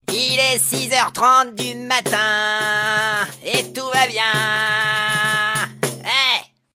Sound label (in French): Sonnerie reveil tambourin; donjon de naheulbeuk